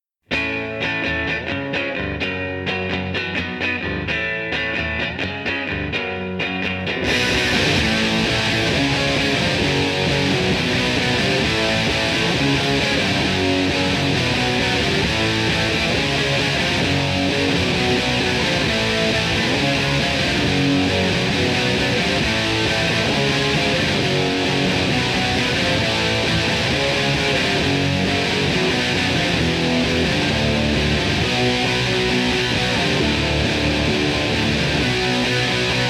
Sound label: guitar